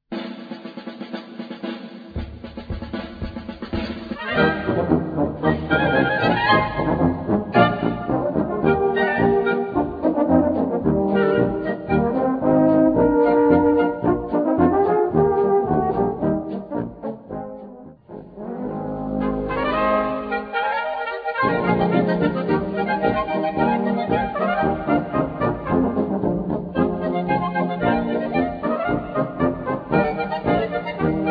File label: POLKA